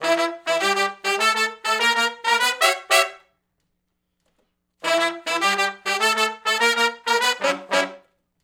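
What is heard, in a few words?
R&B Riff (Emin) unison to harmony, Horncraft; Sony Creative Software; Crimson Blue and Fabulous Horncraft 4 RnB; Horncraft for R&B; Sections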